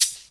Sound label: clean closed high hat sound f sharp; clean closed high hat sound f sharp key; hat samples